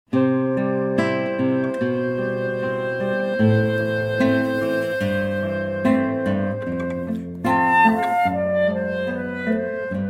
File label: Air on the G String